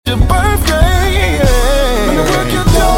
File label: low birthday yeah